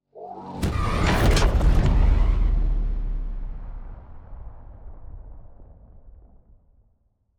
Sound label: ship destroyer move; Stellaris; shroud; ships